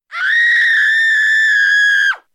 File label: woman scream